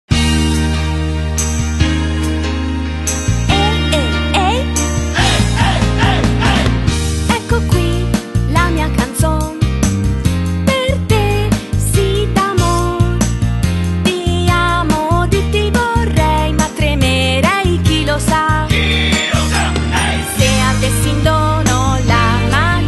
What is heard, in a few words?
Fan Club Song Italian